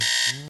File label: alarm buzz